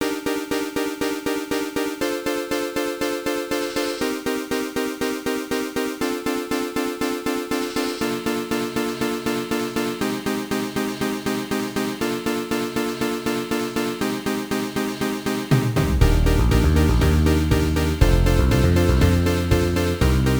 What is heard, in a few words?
Level Up; Mario Tennis: Power Tour Enhanced Version; GBA